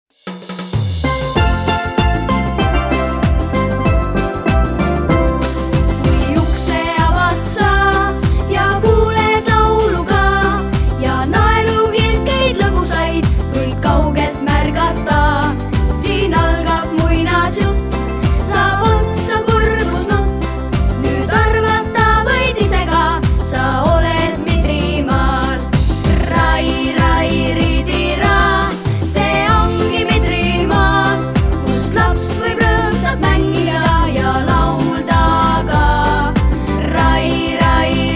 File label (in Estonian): Midrimaa laul